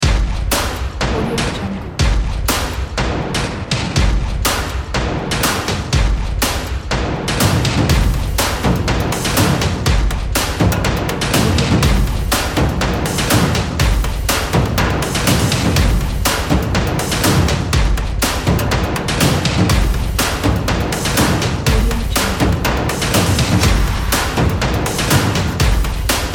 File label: heavy cinematic percussion trailer